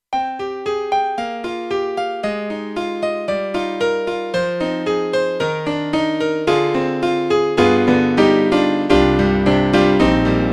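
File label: YAMAHA MU5 Bright Piano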